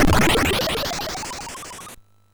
sid MOS6581R4

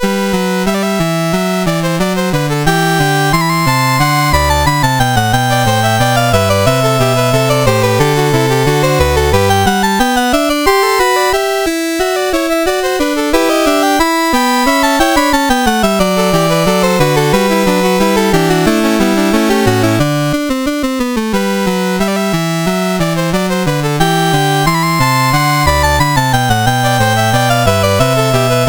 Variation, MOS6581R4; Goldberg Variations

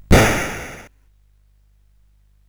sid CSG8580R5

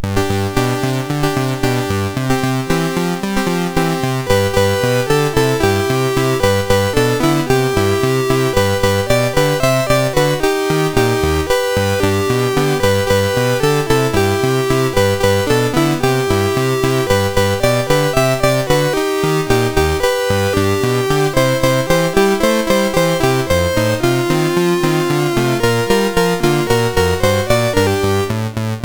MOS6581; MOS6581R2

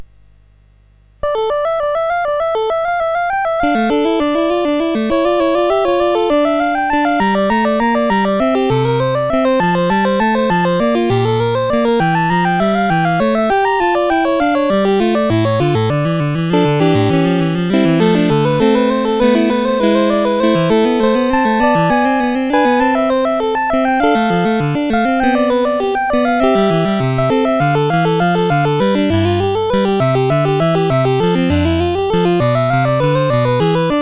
Allegro in D Minor, CSG8580R5

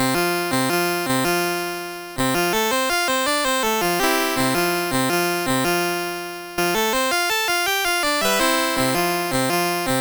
Hunting Song, MOS6581; MOS6581R3

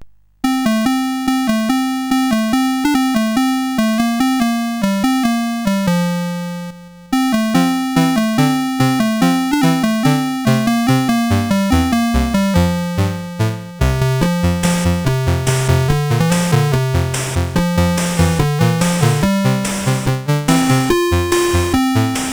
Fireworks Celebration, CSG8580R5

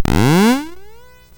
Frogs and Flies, MOS6581R2

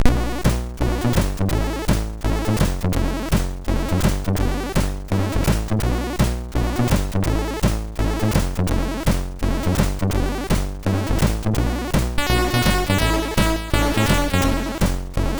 Memory Tune, sid MOS6581R2

